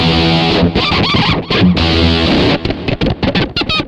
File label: fiddling, guitarra; loops; sampleswap